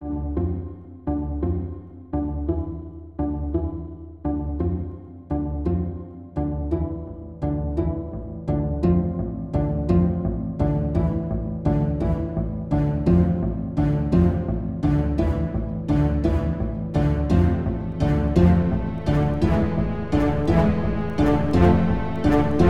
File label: Dramatic Ostinato